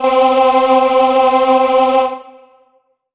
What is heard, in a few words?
Choir Aahs C4; Korg NS5R